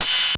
606 CYM, TR-606; Hollywood Edge; The Big Bad Beatmachine

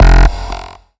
Bass F, Bass Synth

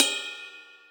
ride, Millenium MPS-400

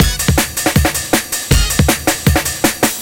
drums, Hardcore Breakbeat; Big Fish Audio; Hardcore Breakbeat Action

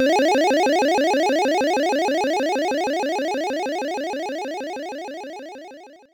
synth, amiga med

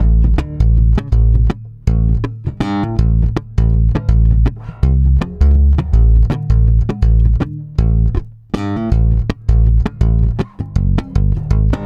AL STRUM B, bass; Spectrasonics; Bass Legends; 88 STRUMMIN

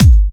Electro Kicks

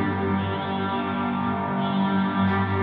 Gretsch Ring FX 170 G, guitar drone

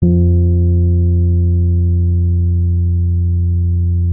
F2, RHODES; Xsample VOL-1

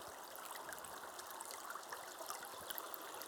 Waterway G Light

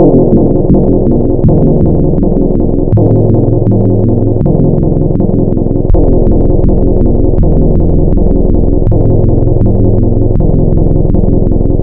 FM - Bin Add FM, wavetables, Frequency Modulation; Architecture 32

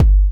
DC 909 Kik Bass, breakcore

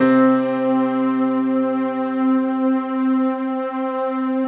soft piano strings, Slow Strings; Optical Media International; Sonic Images Library

